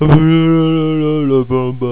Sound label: la bamba; fatman